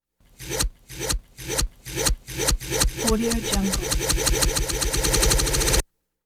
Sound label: hedge clippers accelerate